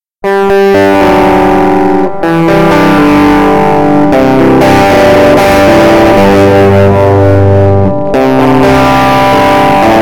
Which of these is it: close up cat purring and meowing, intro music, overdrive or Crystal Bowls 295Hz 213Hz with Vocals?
overdrive